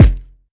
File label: Heavy kicks